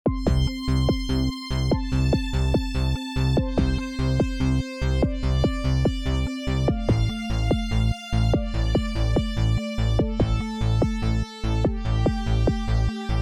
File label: call rain call sun shaman